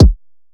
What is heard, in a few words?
KICK ONE SHOT